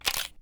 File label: photo camera shutter